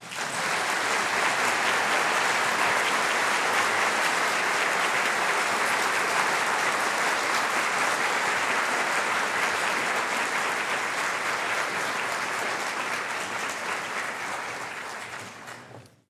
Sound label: Applause